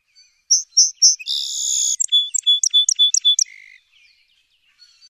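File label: Song Sparrow A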